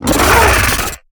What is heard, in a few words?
Pain Light; Evolve; Gorgon; Lurker